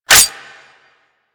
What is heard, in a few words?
Dual Pistol Mech 2D; Grn Queen Guard Dual Pistols